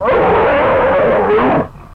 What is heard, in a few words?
Bear Roar Pain Frustr